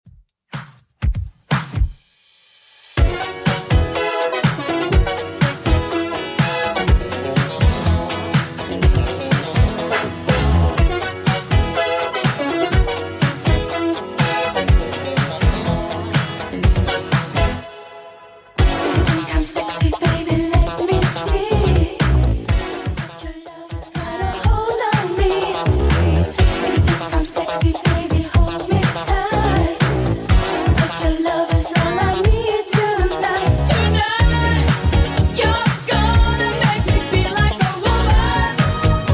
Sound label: 80s Redrum 123bpm; remixplanet